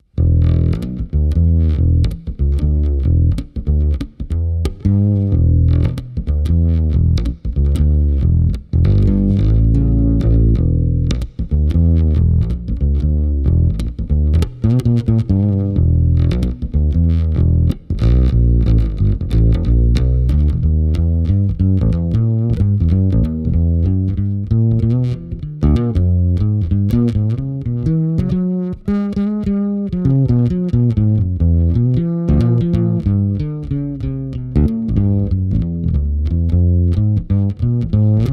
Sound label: jazz funk solo